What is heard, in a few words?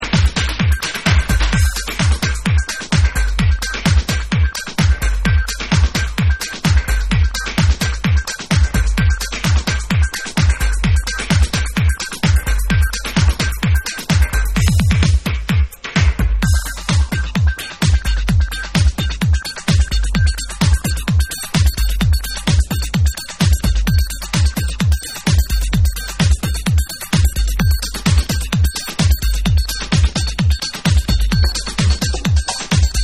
Beat